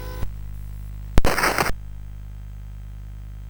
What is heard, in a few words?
sid CSG 8580R5